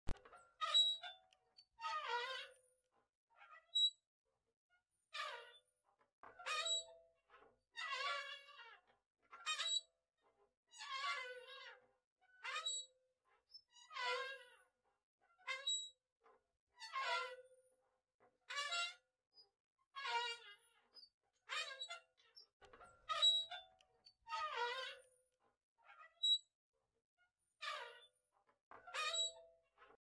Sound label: Scary swing movement